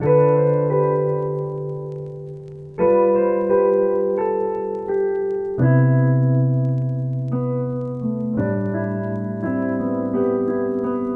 E Piano - 86 BPM C Maj; Melodics; Cymatics; Eternity Sample Pack

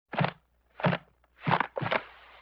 Comedy Motor, Vintage Cartoon Foley

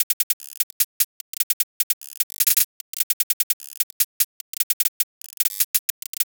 Hi-Hat Loop 150; Dubstep

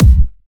Kick, Drums; Bonus Drums

